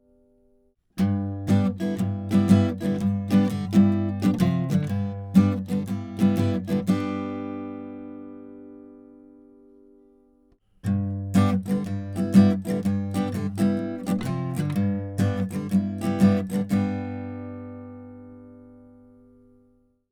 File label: AKG c414XLS vs AT4050 Standard Guitar Pick